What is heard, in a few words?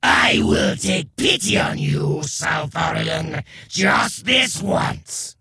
respond, creature; Shadron